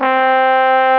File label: TROMBONE 60; Dark Forces Demo; Dark Forces Instruments